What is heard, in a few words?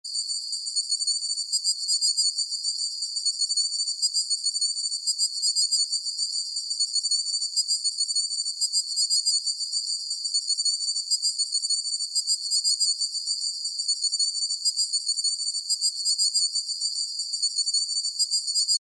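high frequent layer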